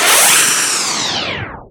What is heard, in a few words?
Misc Short FX